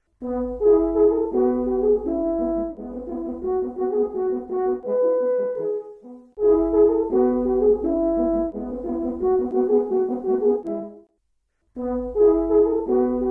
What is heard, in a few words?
allegretto, 2 horns; Stich J.V.: Duet Nr. 6Allegretto